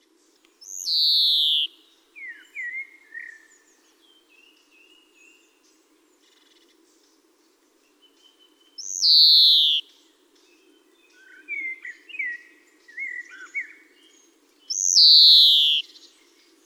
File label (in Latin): Chloris chloris; SETE